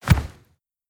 odnotonnij zvuk razrusheniya vojna

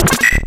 krik

